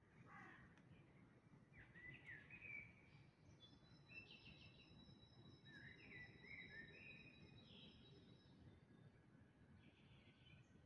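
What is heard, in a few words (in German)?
Dawn Chorus, Vogelgesänge vor Sonnenaufgang; Dawn Chorus 2025; Anton-Saefkow; Amsel; Es war aber immer noch recht windig; Nebelkrähe in Berlin; Straßenlärm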